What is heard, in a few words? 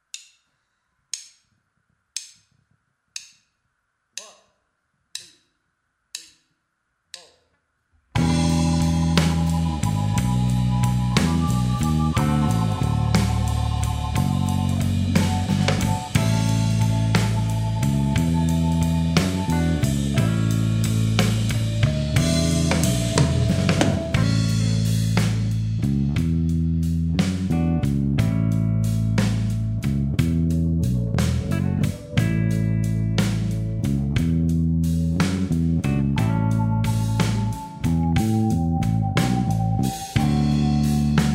slow blues A